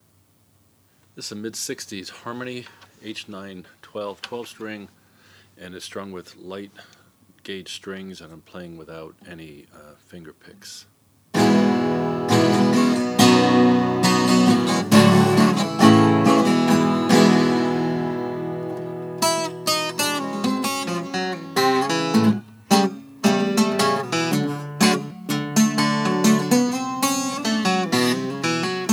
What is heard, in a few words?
harmony h912 redburst